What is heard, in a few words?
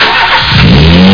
Car; Sound Library 2000; Wayzata Technology